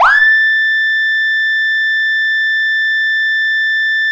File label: manual, sirens; federal sig 650